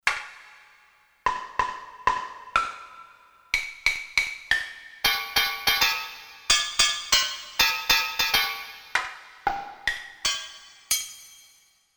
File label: Hammers